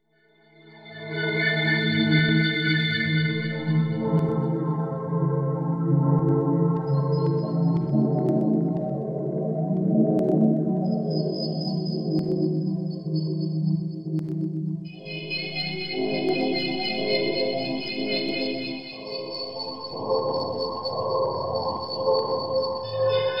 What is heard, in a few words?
F Augmented 60, Deconstruction